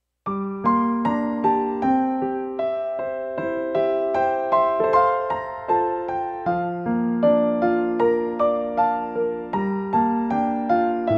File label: Piano Cover